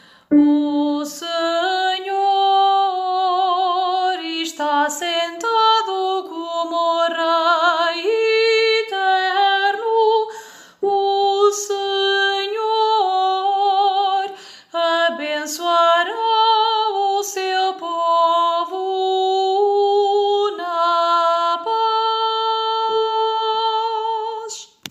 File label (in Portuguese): Comunhão Alto